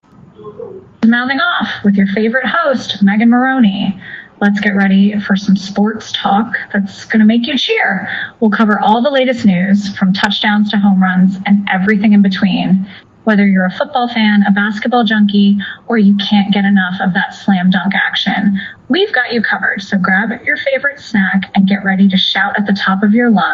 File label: singer